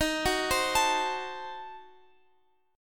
D sharp Diminished 7th D sharp Guitar Open F; arpeggiated

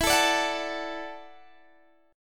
E 9th Suspended 4th E down Guitar DADGAD

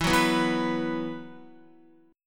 E Suspended 2nd, Esus2 chord, Guitar; strummed; x,7,6,4,4,7

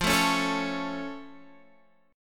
F Augmented F Guitar Half Step Down

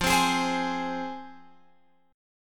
F Minor, F, Guitar, Open F; strummed

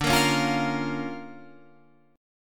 D Minor Major 7th Sharp 5th, D, Guitar, Standard; 10,13,11,10,11,13; DmM7#5 strummed; JGuitar

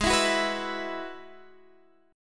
G sharp Minor 7th Sharp 5th G sharp; G#m7#5 strummed

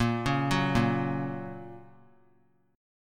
A sharp Minor Sharp 5th, down; arpeggiated; JGuitar

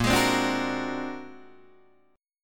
Bb Augmented 9th Bb, Guitar Open D

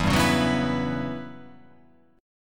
E Minor 6th Add 9th chord

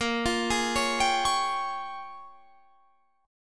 A sharp Minor 13th A sharp, Guitar chord

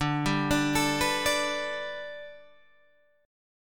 G Major Add 9th Guitar Standard, chord; Gadd9/D arpeggiated; JGuitar

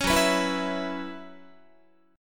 F sharp 7th Suspended 4th, Guitar

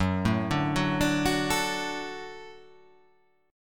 F sharp Minor Sharp 5th

